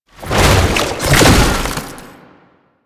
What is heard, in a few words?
Run Stop, Foley; Enemies; Warframe; Proto Infested; Babau